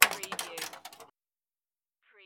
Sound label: Pinball sound, clank with ball travel